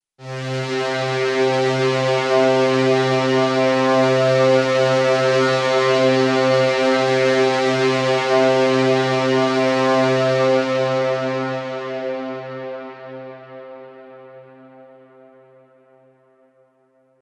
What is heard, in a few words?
Envelope 4 Bar; Elysion 2; Iron Planet